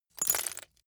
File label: glass debris fall single short